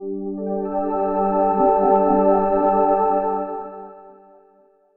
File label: Jingle Caution; wii fit u